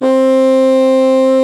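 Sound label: HARD BASSOON C4; Northstar - New Gold VOL-2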